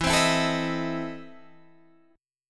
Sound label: E Major 7th Suspended 4th Sharp 5th, Guitar, Open G